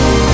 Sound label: HIT, STABS, House